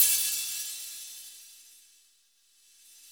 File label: CYM 15, Brush Kit; Club-50 - Foundations Roland; Brush Kits